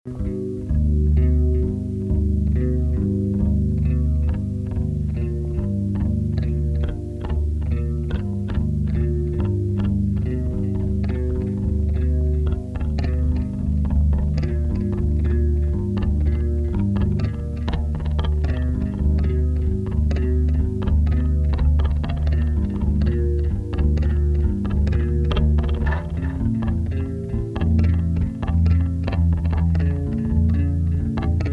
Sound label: bass solo; hoffman estates 1990